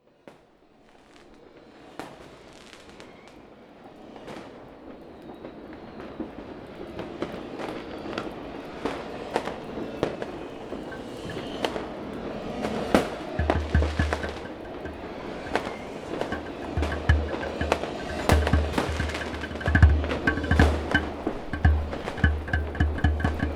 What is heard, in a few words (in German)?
djembe feuerwerk